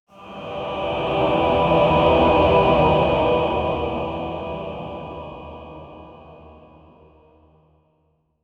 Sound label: massive chorus energy wav, apocalyptic